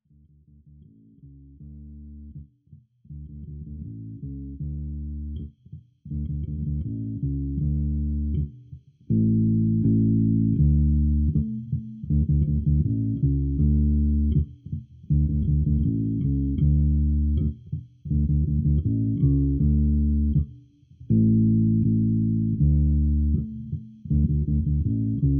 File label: bass